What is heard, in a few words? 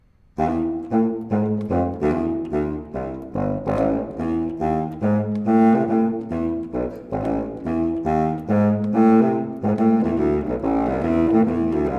Jazz Walk